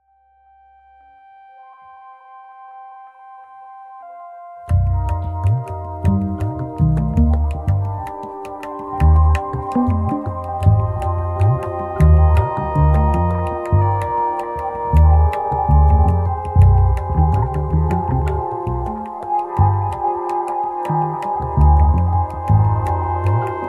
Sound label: darbari; rakkatak